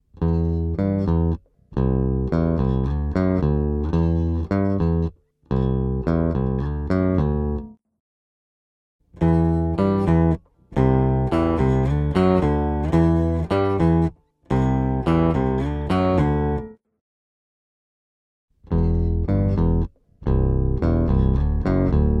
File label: Pitch Shift; Bass Tone